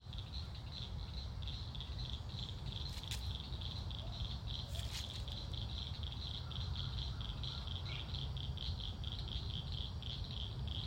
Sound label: frogs with a few birds; field recordings; scout island